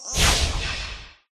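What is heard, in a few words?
leprechaun attack middle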